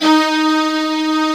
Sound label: D#2 cello; AKAI S6000 CD-ROM - Volume 1; Strings